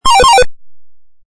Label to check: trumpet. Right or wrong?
Wrong